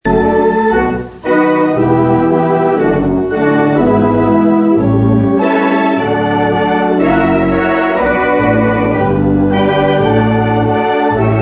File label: Christie Organ, Danish song for kids; Christie Organ of the former West Ham Kinema